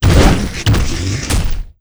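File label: wind draco spawn, monster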